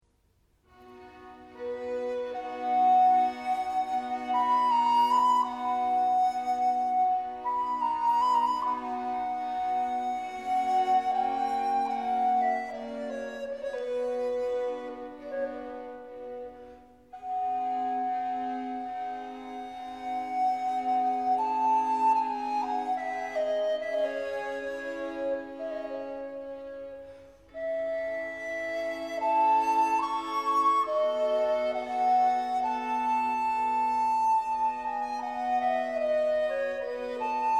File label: Concerto for Flute, Strings & Basso Continuo in G minor Largo; Op.10 No.2 La Notte; 비발디